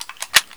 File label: Inf Weapons Foley 3rd; Red Orchestra 41-45